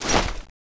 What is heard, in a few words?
clip in; tfa cso; x-7